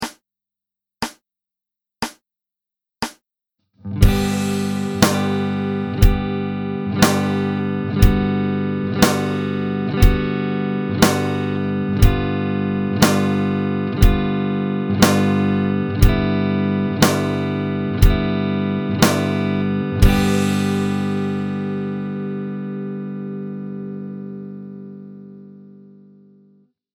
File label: two finger chord exercise